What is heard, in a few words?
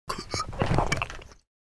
Man retching